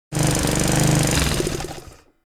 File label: Electric lawn mower engine stop; garden